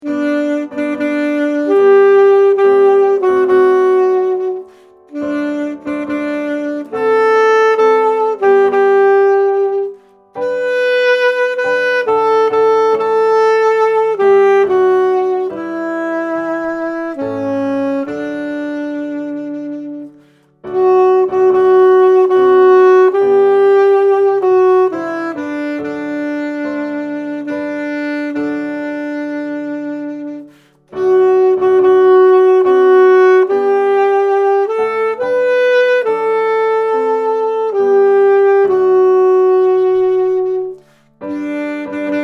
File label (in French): cantique suisse sax; fete nationale